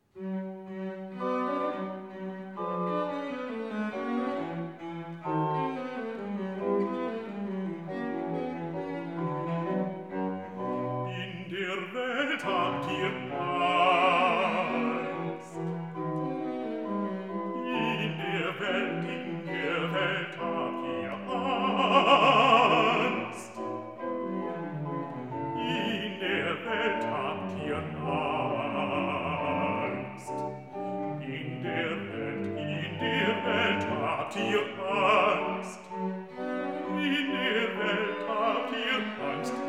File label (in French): Arioso de basse; In der Welt habt ihr Angst aber seid getrost